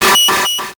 Title Transition Communication Distortion Jammer; Adobe; Production Element

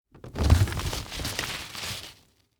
Umbrella Close, Household

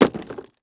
Impact Debris Large Plastic Drop On Ground; Impacts

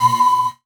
Buttons Mechanical

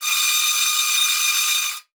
Transition Metallic Drone; Production Element

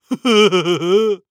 Cartoon Male Dumb Shy Giggle

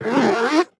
Zips Plastic Zips, Household

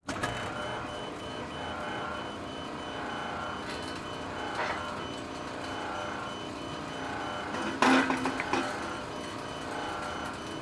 Emergency Electronic Security Gate Closing; emergency effects; AdobeCC

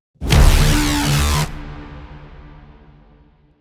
BRAMS, Cinematic Sound Effects

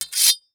Weapon Sword Hit Metal Scrape; AdobeCC

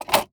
Foley Toy Slam Closed Door

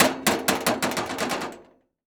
Sports Grill Metal Flap Bouncing Open

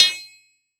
Impact Metal Bar Hit Ring; Impacts

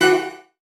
Multimedia Internet CD-Rom Flash Ding

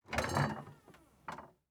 Fridge Open Door Mini Fridge With Glass Bottles; household

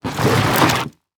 Impact Treasure Chest Drag On Dirt Short

